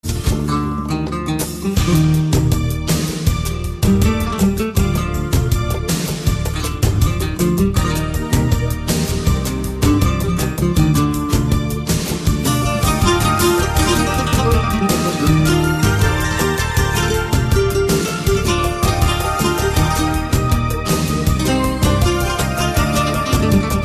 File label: Arabic Soft